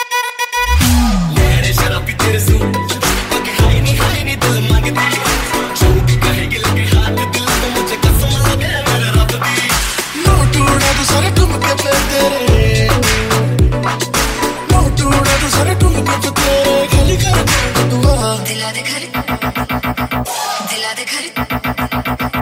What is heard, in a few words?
punjabi ringtone, bollywood